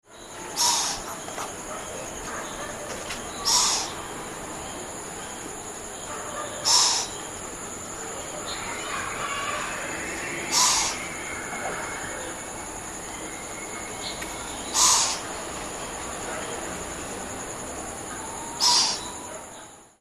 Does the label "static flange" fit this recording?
No